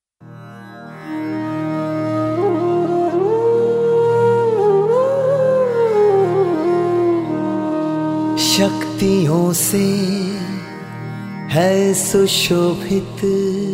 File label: Solo Full Songs